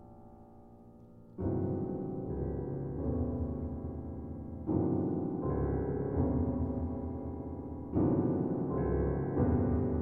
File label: Ballad